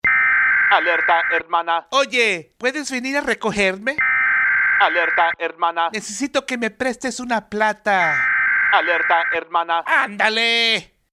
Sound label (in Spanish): alerta de hermana